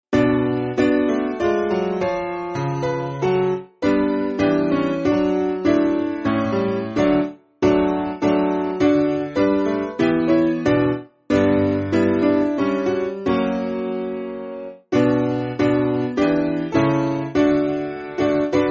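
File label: Piano, Free Music Backing Files for Churches; Liebster Immanuel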